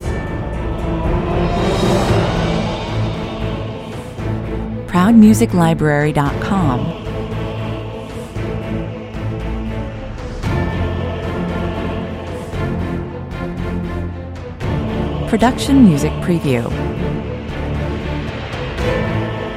with choir

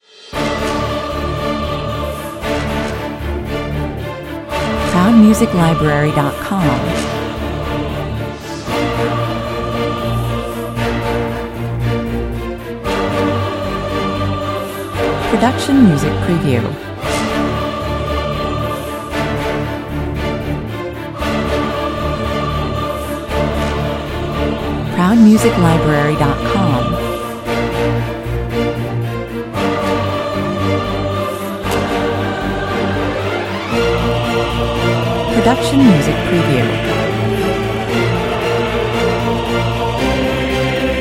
no perc high intensity

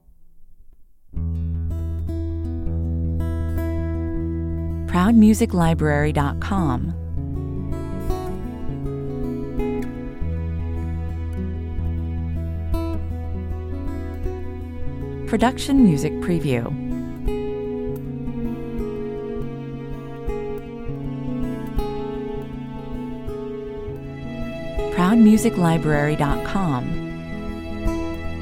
pastoral guitar and cello 120 bpm

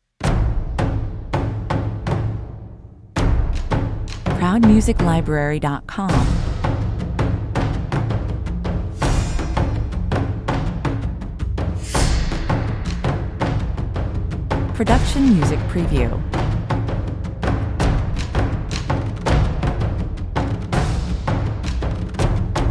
thai drummer

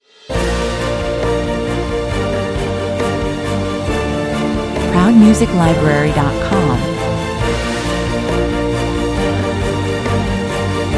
no choir

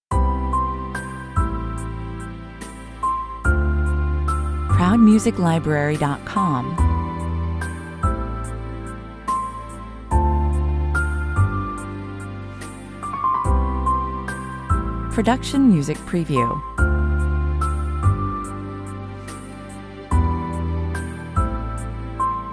dinner music, 72 bpm

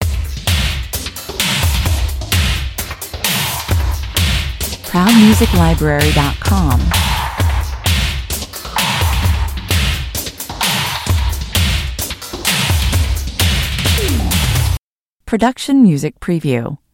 underscore version drums loop

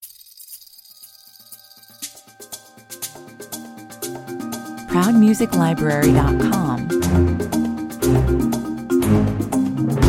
conqueror 120 bpm

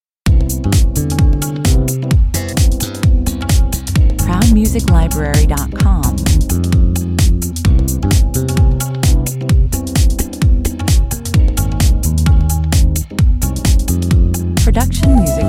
ethnic night life 130 bpm

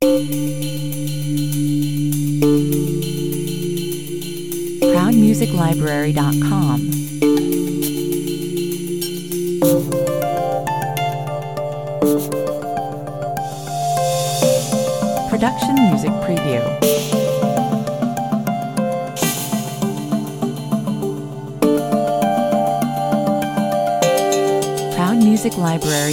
taichi sound 100 bpm

